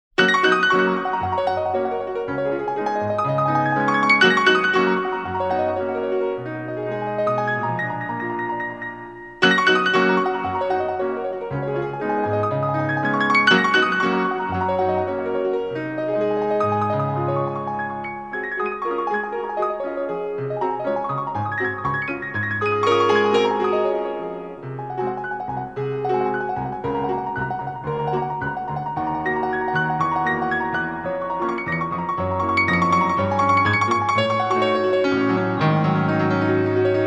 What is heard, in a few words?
PIANO lightning fast fingers, Chopin Etude nr.5 Opus 10; Instrumental